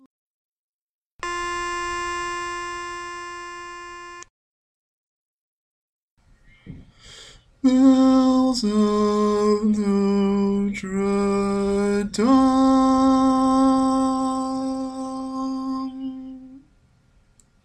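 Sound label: Baritone, barbershop tags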